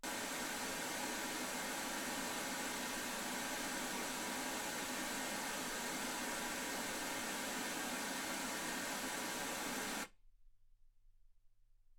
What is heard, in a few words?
wgn